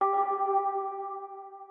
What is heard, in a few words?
organ